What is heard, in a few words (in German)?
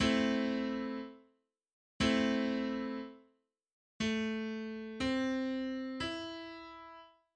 dreiklang; Musik; Realschule